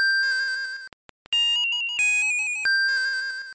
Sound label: Sing; sfx; attack moves; pokemon; gen 2; gsc